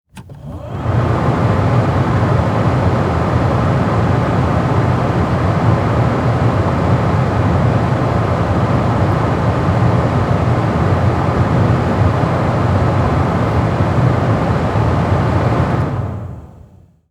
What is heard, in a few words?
Cars Honda Civic Run Heater Medium; transportation